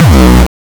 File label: 909 Dist Reso Crushed - B1, Kicks; Mbase 11 Kicks